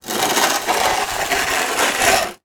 Weather Snow Shovel Drag On Sidewalk Long

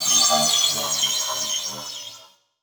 Title Transition Descend

